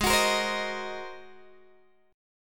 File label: G sharp 9th, Guitar, Open G